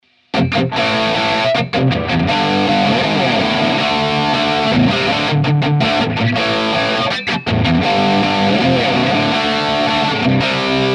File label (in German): gibson les paul standard crunch steg